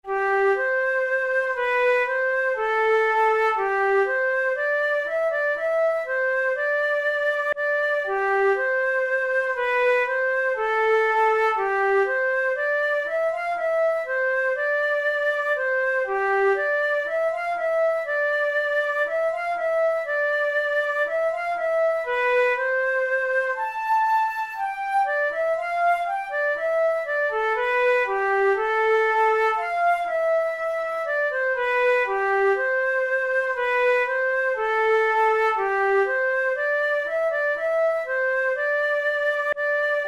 allegro non troppo, flute; brahms; symphony no1

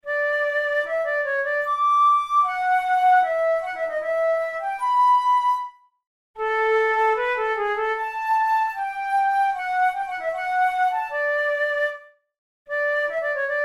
studies for the boehm flute; drouet 72

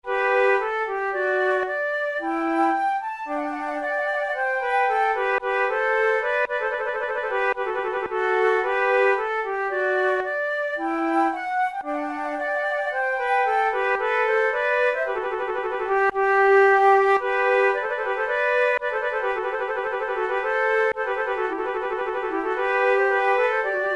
for two flutes rondeau; Monteclair; 6 concerts; flute tunes

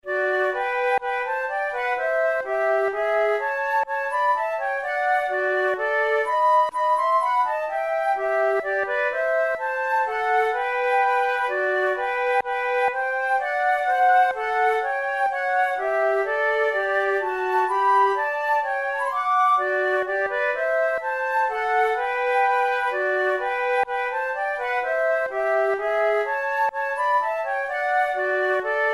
duet, flute

